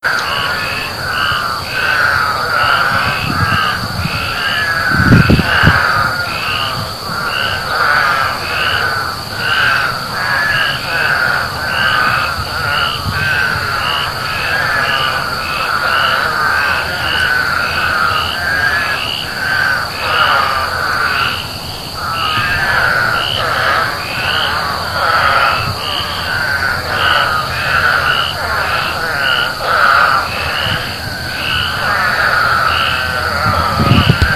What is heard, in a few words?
Tree Frogs; Topsail